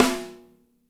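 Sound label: piccolo snare left, NATURAL KIT; Spectrasonics; Burning Grooves CD2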